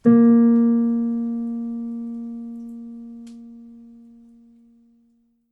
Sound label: piano sample